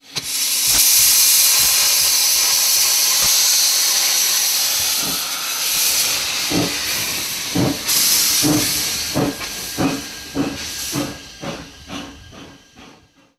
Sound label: gwr railmotor; simutrans; Pak128.Britain-Ex